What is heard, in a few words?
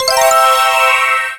win; Amatic; Book of Fortune